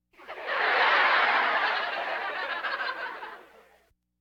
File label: Laugh tracks